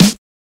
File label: 2000s reverb acoustic snare g sharp key